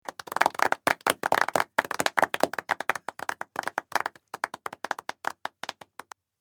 applause small indoors, Applause -Small Group Indoors; Small Group Claps Quietly; Human